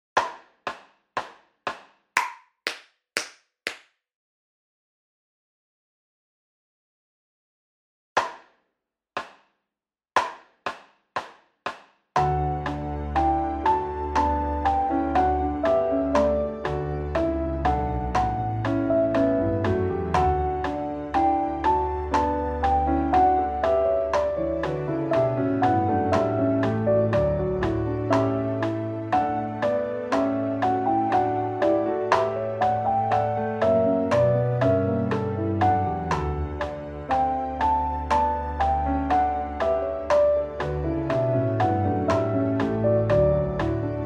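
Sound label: recording playback with click track; weplay4ukraine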